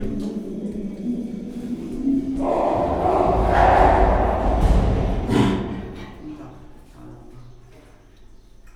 tavolabb egy zajongo majom, csimpanz; gyori zoo 2014

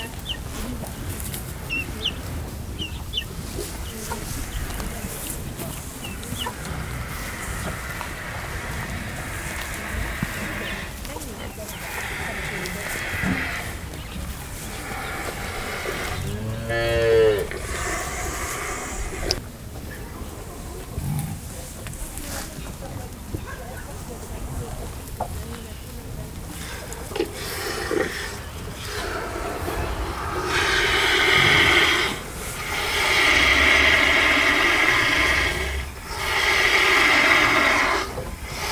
iszik a tehén, magyartarka szarvasmarha; kiscsala; parasztudvar

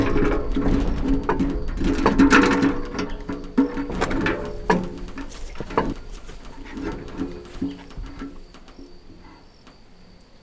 zorges a vodorrel, kerekes kut; varboc

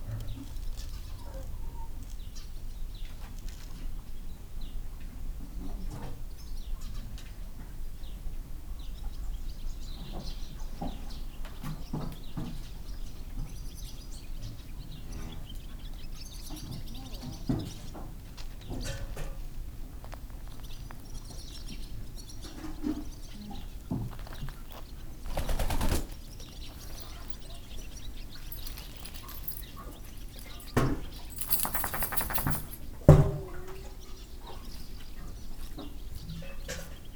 kutya galamb tyúk és fejéshez készülődve; parasztudvar

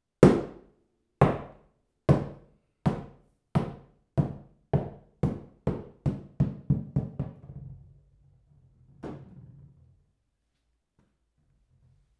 pattogas, tomor gumilabda; perkupa

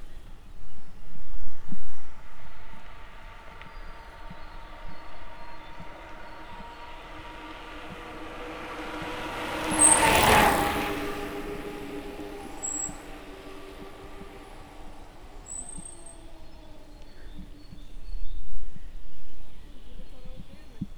lefele egyedul, kerekpar tura; visegrad

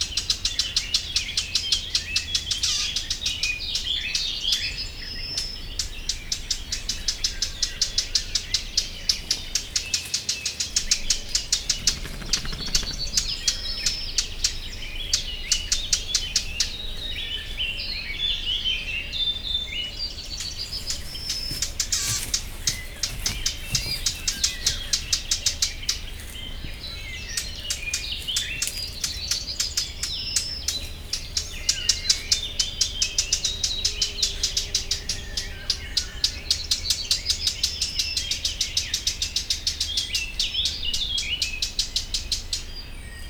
madár vízház, barátposzáta; termeszetben; cardioid

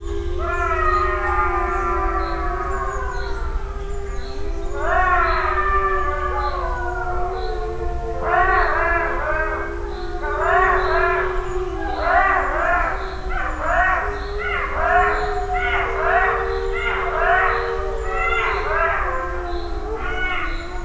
vonyítanak ugat az aranysakál, szürke farkas; szegedi zoo 2011

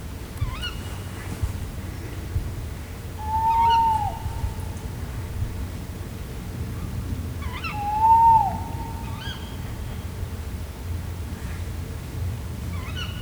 kettő macskabagoly, víz ház; cardioid; termeszetben

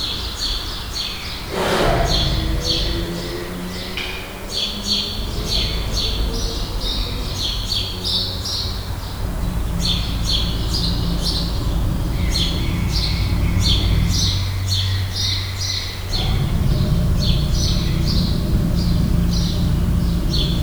hangos madarak, halk horgés, bengáli tigris; kecskemeti zoo 2016

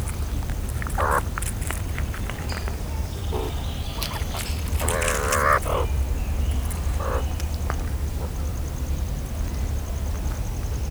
szurikáta; szegedi zoo 2011

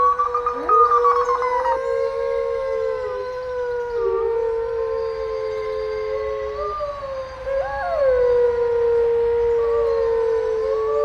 vonyitas es ugatas, kanadai feher farkas; nyiregyhazi zoo 2019

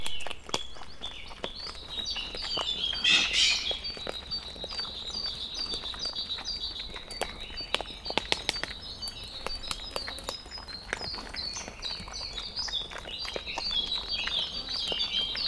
kukoricát ropogtatnak egymással morgolódó sülök; tarajos sül; felsolajos zoo 2015; cardioid

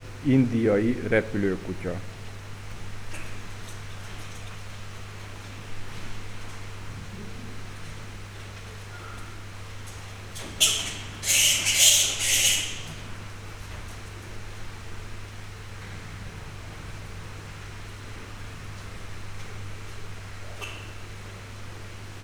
indiai repülőkutya; állathangok; nyíregyházi zoo 2010

